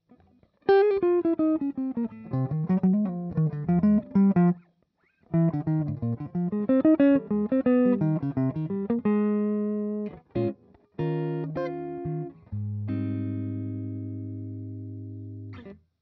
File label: Jazz; Peerless TMC3